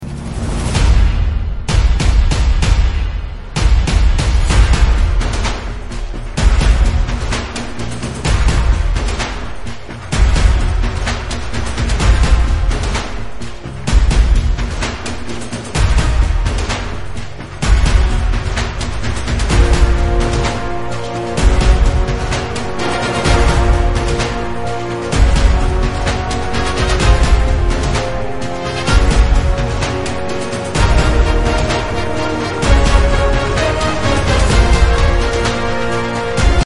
Karaoke

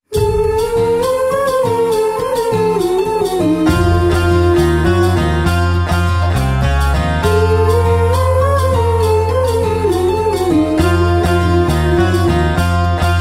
Bg Humming

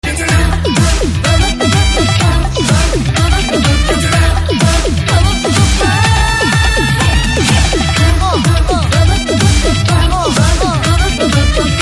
Ringtone

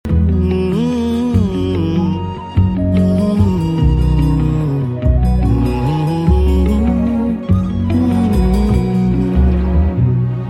Bg Humming Tone

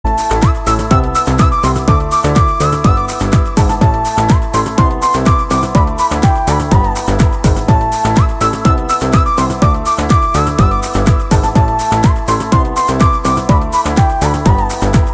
Love Bgm

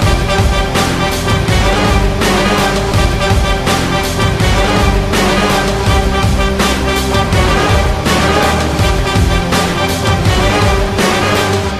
BG Tone

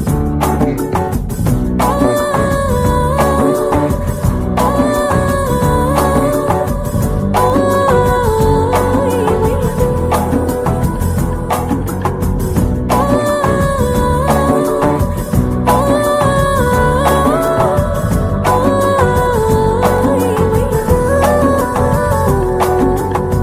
BG Humming

